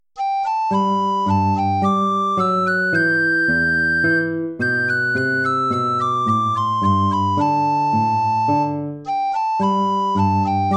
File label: gavotte; haendel georg friedrich